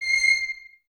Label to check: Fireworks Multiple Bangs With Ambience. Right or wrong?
Wrong